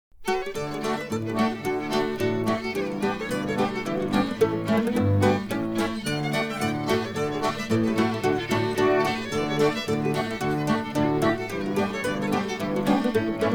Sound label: Instrumental; 1971